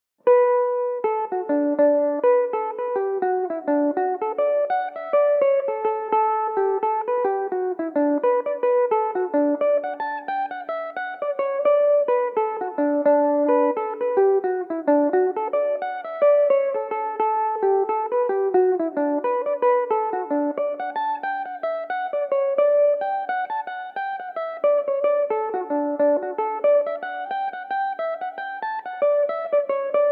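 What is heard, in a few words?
D major belmuse, irish mandolin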